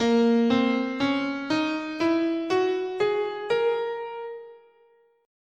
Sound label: Asharp Locrian sharp2